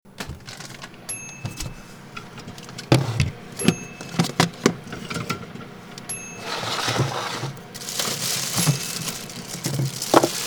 Person Opening a Refrigerator Going Through It and Closing It